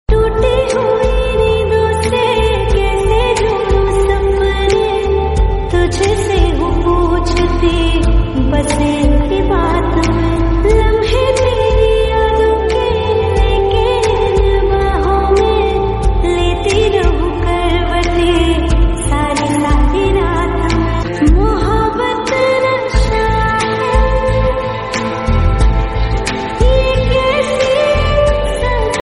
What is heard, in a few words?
hindi ringtone, bollywood ringtones